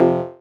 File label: Fm Dub Chord